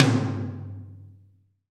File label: TOM TOM, Roland